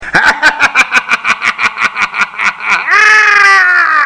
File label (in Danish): sjove grin, direktor